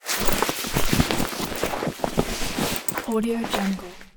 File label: war ui source pick up empty backpack from surface put on